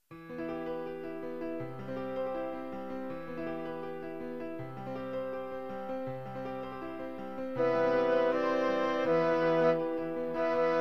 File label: Birdsong